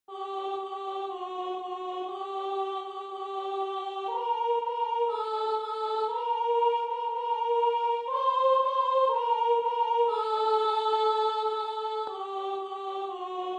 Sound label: Sanctus Soprano; Messe De San Lorenzo